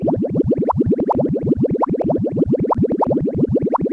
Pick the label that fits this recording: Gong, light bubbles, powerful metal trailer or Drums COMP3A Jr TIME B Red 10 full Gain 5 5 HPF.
light bubbles